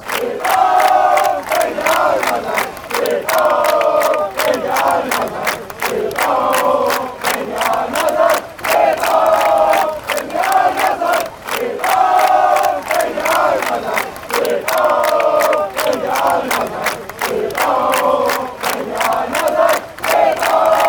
chants; stand up

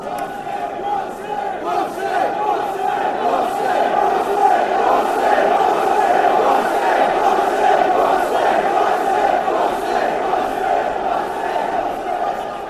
come, chants; bcfc

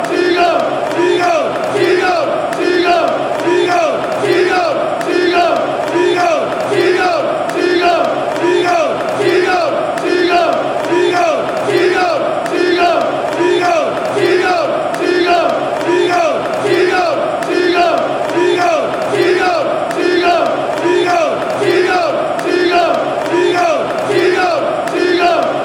seagulls chant; football chants; anthems; premiership

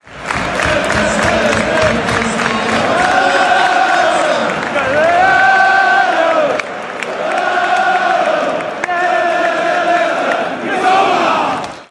our samurai, chants, football songs; mitoma; brighton

